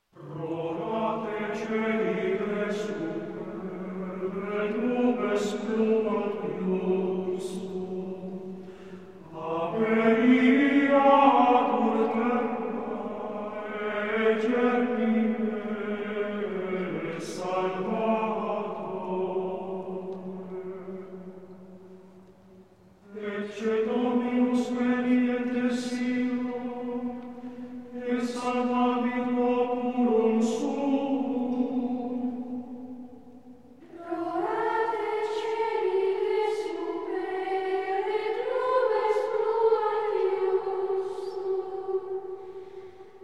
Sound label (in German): Ambrosianischer Choral - Antiphona; Rorate caeli